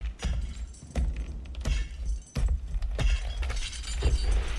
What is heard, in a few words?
walking